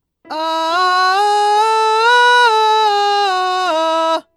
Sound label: mixed voice hard mix voice